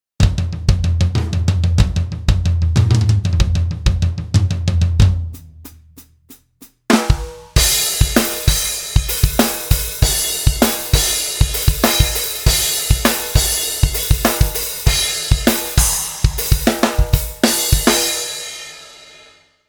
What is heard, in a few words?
Heavy metal; KontaktPlayer